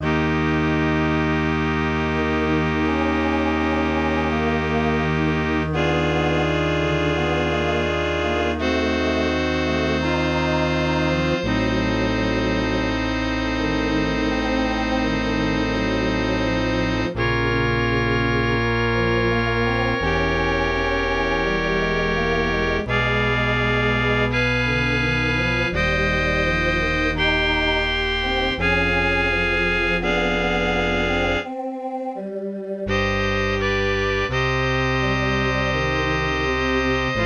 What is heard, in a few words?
MIDI